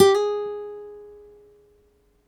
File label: g 3 slide